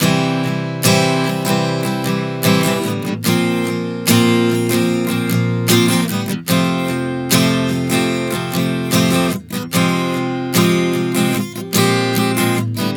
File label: Progression 074 C-Bb-F-Fm, Acoustic Guitar, Chord Progressions 074 bpm; Sonic Foundry; Songwriter Acoustic Guitar Companion